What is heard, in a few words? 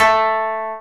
KOTO A2; USB Soundscan vol.60 - Asian Traditions; AKAI